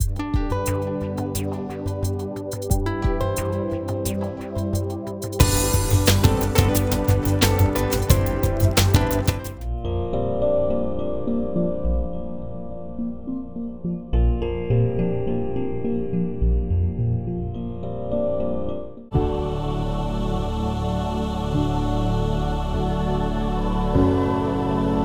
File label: Inspirational short